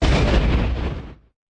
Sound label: explosion